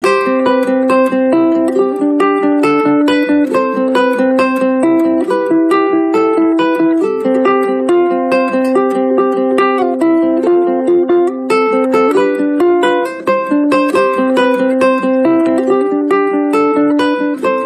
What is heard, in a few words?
ukulele; akg